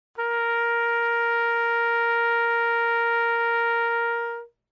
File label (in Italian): Tromba Sib